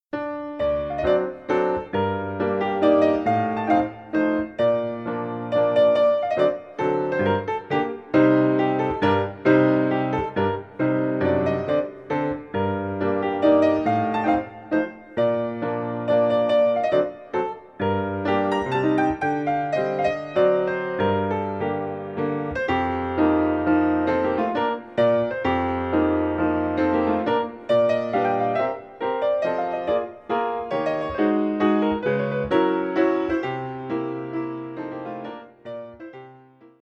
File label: mazurka